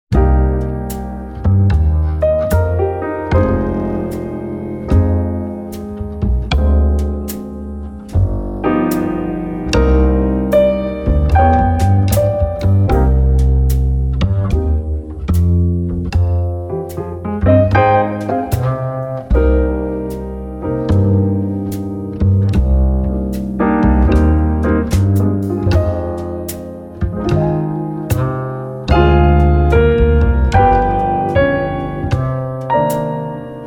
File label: Slow Jazz Ballad, flutist; Practice Song No 4; the ii v i progression